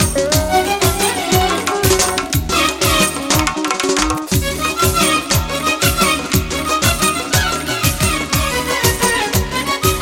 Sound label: Violin Flute